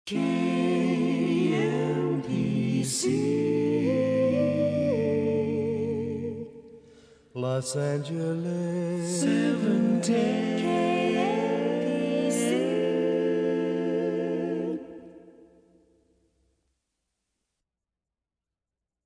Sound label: Acapella Slow